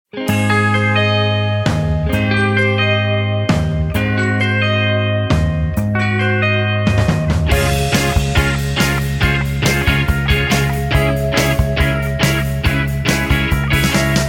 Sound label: Ab No Backing Vocals